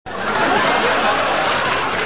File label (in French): rires